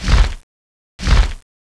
imp wings; eqclassic

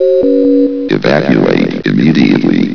run

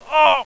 get hit; eqclassic

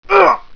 pain; wartso; barney

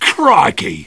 jeers; sniper

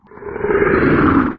mouth open; sven; npcs; cthentaclhu; malanth

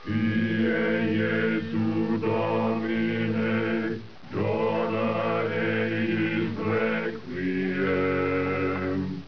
monks, shaolin

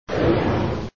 flame run, rex

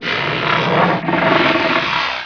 dragon idle; eqclassic

